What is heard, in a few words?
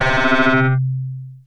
strings